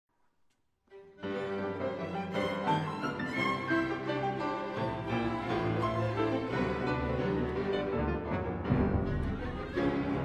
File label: Piano Concerto No. 1 Allegro scherzando; Prokofiev